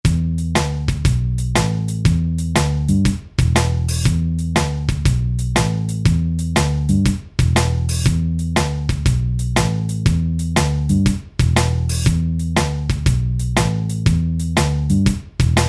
Shuffle

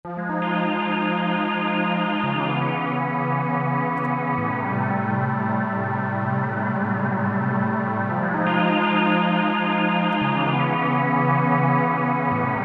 Cool Beam

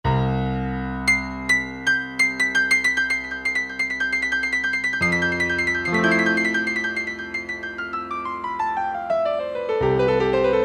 Wide Piano, Roland U-110; Factory Patch

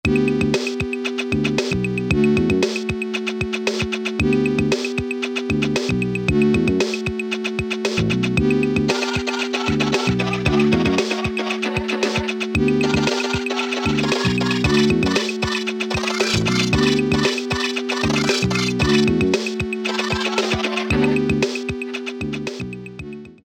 HOUSE 3, Casio Rapman rap patterns

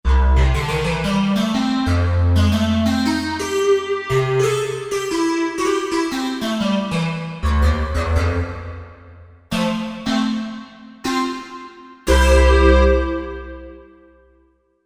Digi Square; Korg MSC-1S Variety

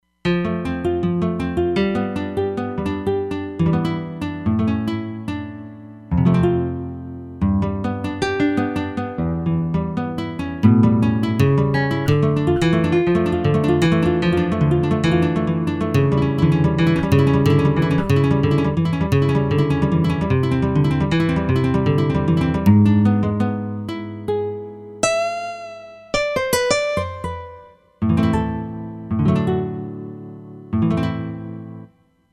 SoftNyln Gtr

